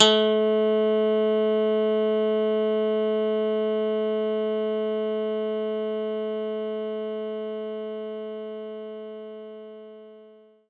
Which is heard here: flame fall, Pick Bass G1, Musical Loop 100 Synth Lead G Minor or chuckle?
Pick Bass G1